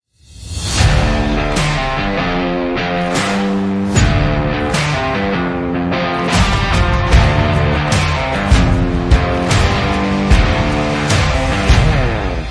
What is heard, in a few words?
Sports music rock opening puncher